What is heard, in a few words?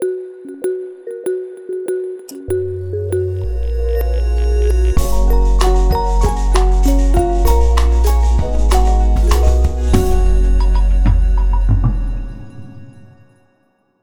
Professional Ident Music